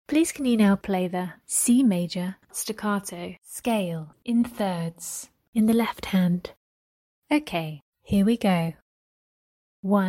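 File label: C major staccato scale in 3rds left hand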